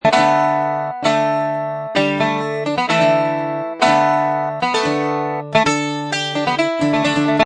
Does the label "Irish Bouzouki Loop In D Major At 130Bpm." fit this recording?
Yes